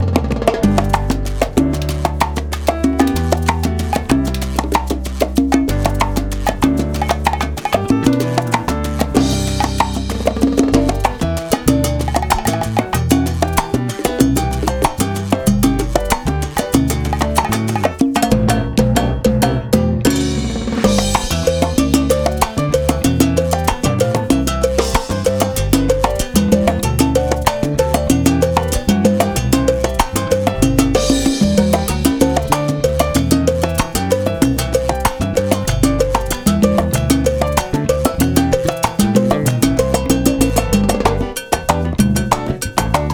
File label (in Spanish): Salsa con Musica